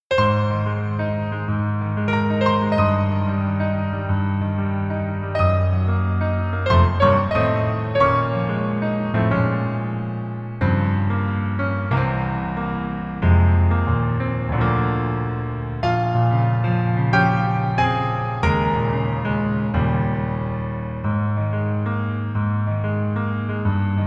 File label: Piano ver